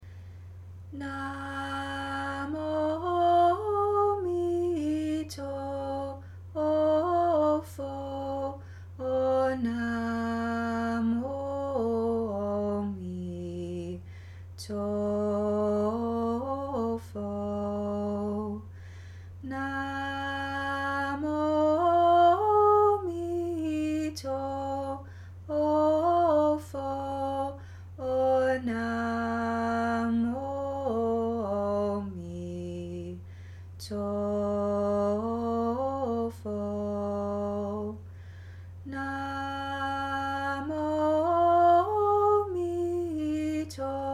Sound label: Chant Namo Omito Fo